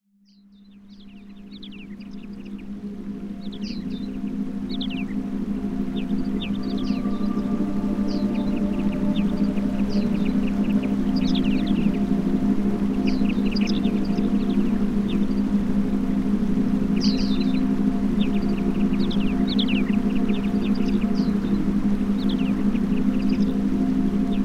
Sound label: Higher Consciousness Healing with Forest Sounds